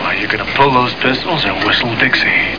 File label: dixie